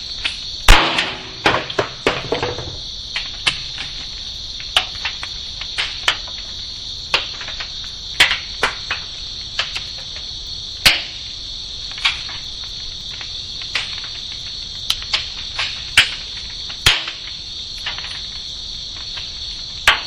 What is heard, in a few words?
Rain of Rocks; Valley of the Apes